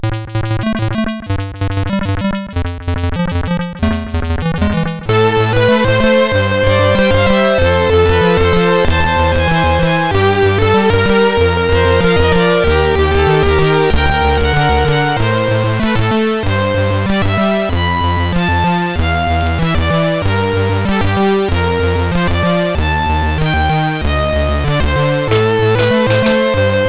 sid CSG8580R5; Illusion

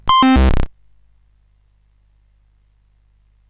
sid CSG8580R5; Jumpman Junior

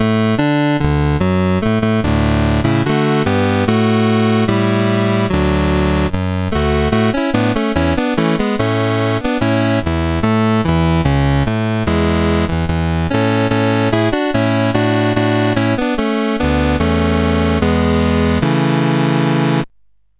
Summer Games, CSG8580R5

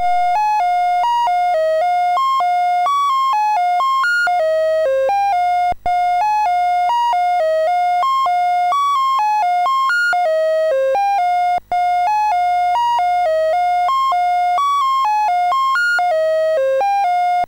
UFO I BASIC, MOS6581R3

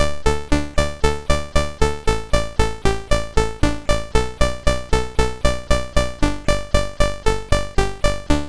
sid MOS6581R2

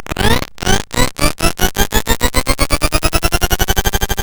sid MOS6581R2

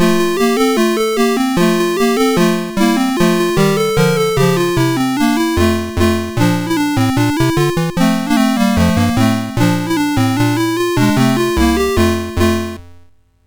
We the People, MOS6581R3

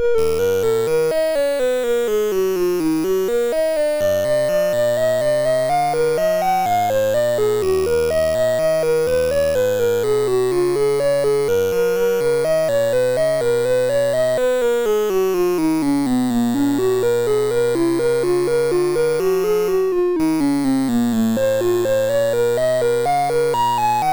Storm Warrior, MOS6581R3

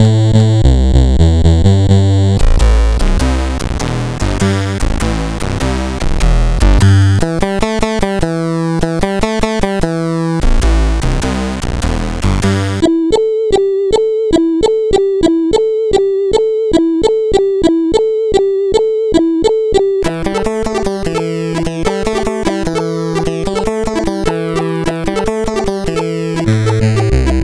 sid CSG8580R5

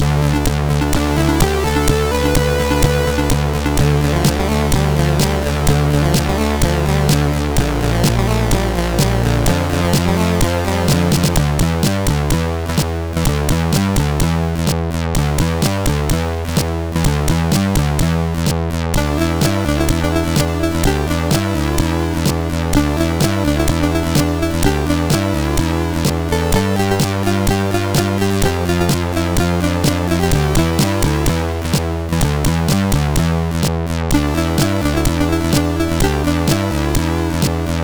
Sunny Shine intro, MOS6581R3

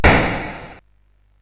sid CSG8580R5; Pick n Pile

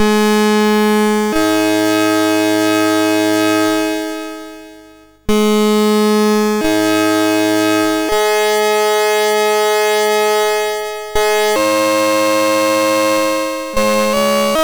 sid MOS6581R3; Space Ace 2101

